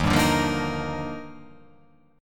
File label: D sharp Major 9th